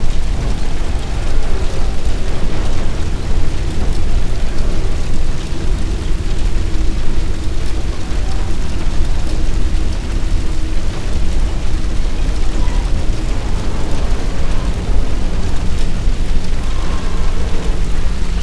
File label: ambience disaster fire